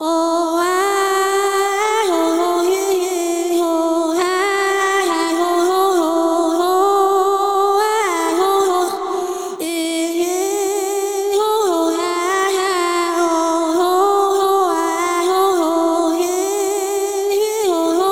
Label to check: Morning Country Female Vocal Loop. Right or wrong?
Right